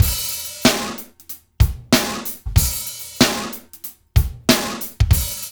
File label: DRUMS; East West